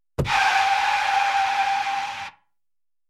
Car Brake Squeak

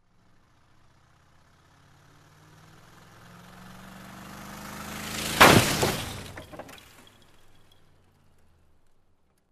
Motorcycle Crash

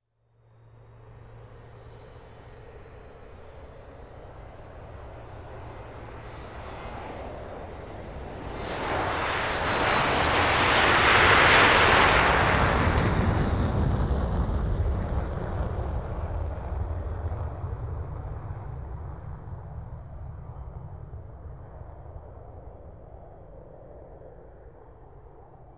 Jet aircraft flying over

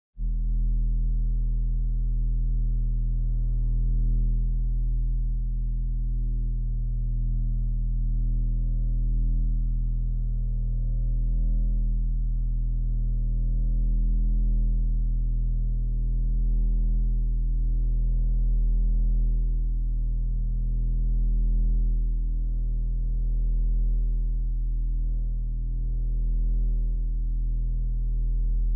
Low mains hum

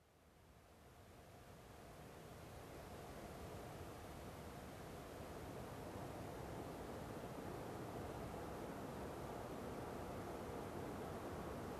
Truck passing by very slow

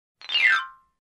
Xylophone cartoon fast falling sound effect

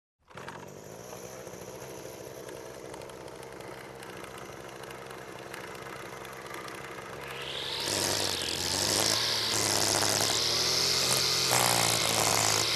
Cutting weed with handcutter

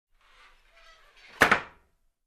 Creaky Doors closing

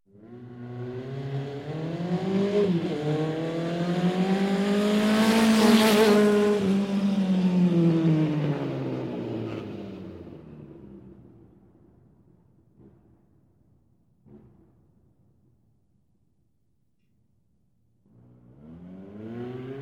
Road bike on race track passing by

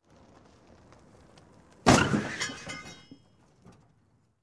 Car Crash with Scattered Debris Sound

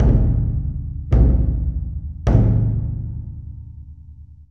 Kodo Drum Hit three times

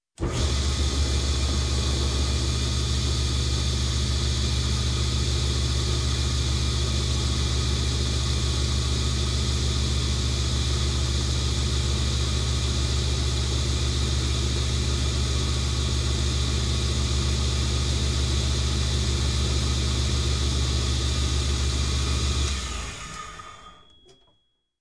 Air Pressure Machine

